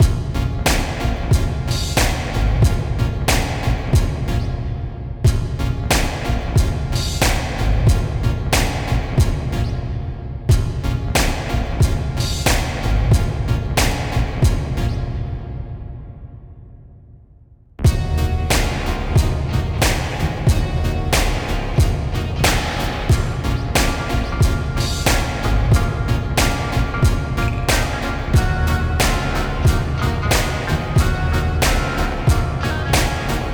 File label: Beat; NBA Live 2003; PlayStation 2